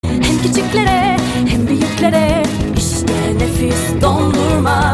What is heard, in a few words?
jingle; panda